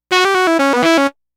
Sax Line; Dr. Fink's Funk Factory

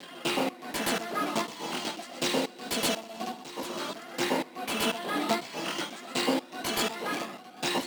122 E FX; Kasumi; MELO + FILTER; RoBKTA Sample Pack Supreme

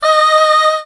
cho d#5, Choir, MIRAGE; ProSamples vol.55 - Retro Sampler; Best Service

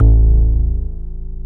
V-S BASS

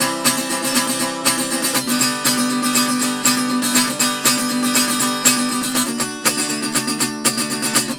Tenor Guitar, Appalachian

